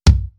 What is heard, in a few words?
Pearl Mlx 22 Bass Drum